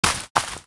Berserk Boss Run

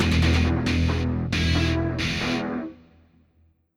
Loop End C

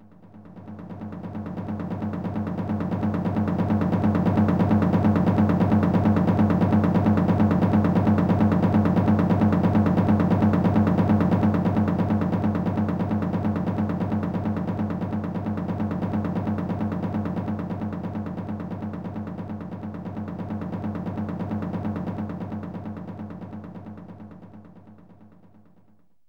Drums; Sensible Soccer European Champions v1.1; CD-32; Amiga

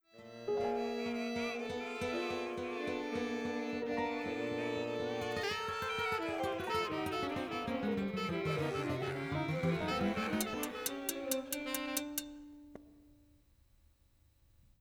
Music Menu Intro; Affect

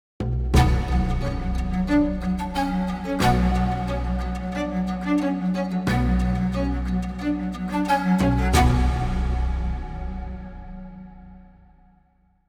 Sting Jungle Noon

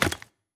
Rune Take; Folk Hero

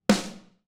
snaredrum

